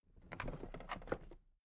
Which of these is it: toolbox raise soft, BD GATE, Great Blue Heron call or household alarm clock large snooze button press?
toolbox raise soft